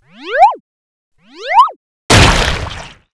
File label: Slime Lump Skill; Seer; GamigoNA